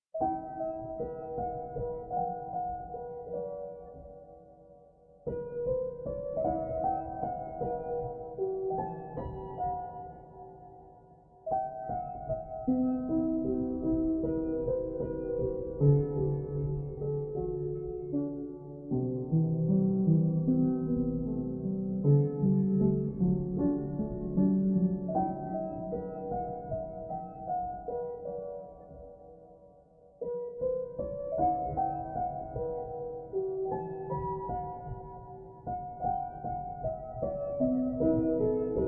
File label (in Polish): pozytywka, 432 hz